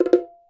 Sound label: battery countdown; Swapperoo; Android iOS mobile Switch Windows